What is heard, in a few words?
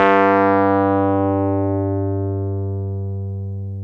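piano, Wurly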